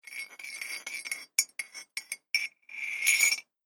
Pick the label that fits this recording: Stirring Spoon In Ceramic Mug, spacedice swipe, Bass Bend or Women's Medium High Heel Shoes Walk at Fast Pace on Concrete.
Stirring Spoon In Ceramic Mug